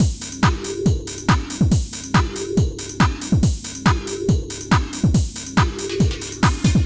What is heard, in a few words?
140 C trance kit; Trance Explosion Vol1